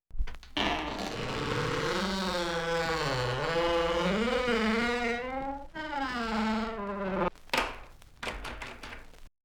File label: creaking door